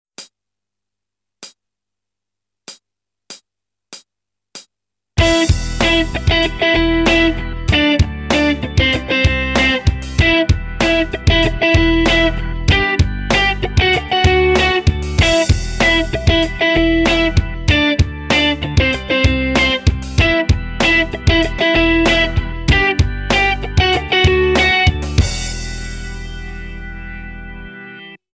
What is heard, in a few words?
Octaves, guitar